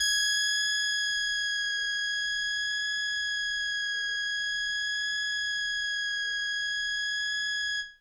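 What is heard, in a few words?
Indie Organ Kawaii G#1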